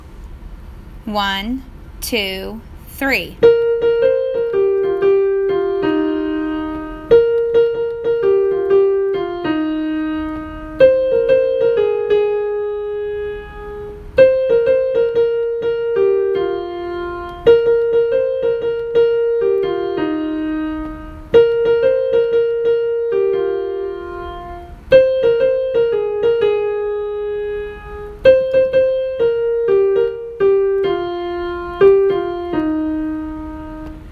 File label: solo